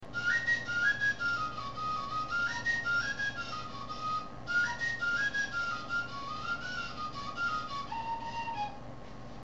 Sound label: Whistle muted